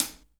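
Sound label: HI HAT, JAZZDRUM; Ueberschall; Drum & Bass Flash Trackz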